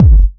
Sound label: Kick, Kickdrum; Equipped Music; Loungin House